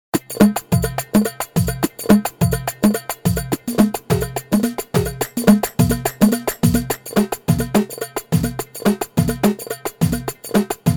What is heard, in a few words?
KHALIJI LOOP